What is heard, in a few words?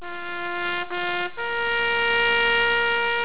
TAPS; DOOM